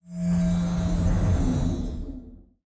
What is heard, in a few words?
phoenix egg, monster